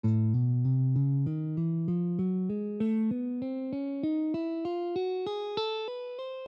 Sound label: A Nine Tone Scale, guitar